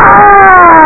aargh 8 bit pcm; space harrier

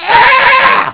scream; beavis and butt head

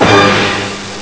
orchestral hit; coca cola kid

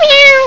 miaow; tamalympics

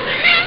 miaow; neko dai suki

